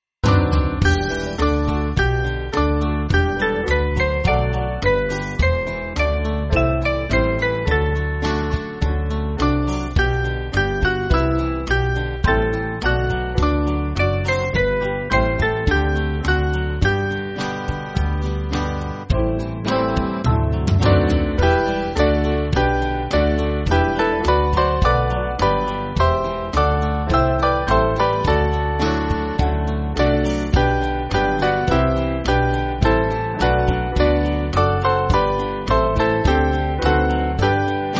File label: Band, Free Music Backing Files for Churches; O Lord Of Life; Dorking